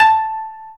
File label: KOTO A4, Asian Traditions; USB Soundscan vol.60 - Asian Traditions; AKAI